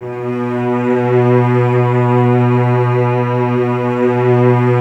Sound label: String Ensembles Cellos B 2; Miroslav Vitous; 90 s Sample CDs